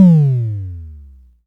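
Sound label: Analog Kick, dry kicks; Quasimidi Rave-o-lution 309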